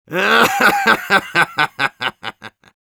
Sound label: Medic laugh evil, ru; Злобный смех 2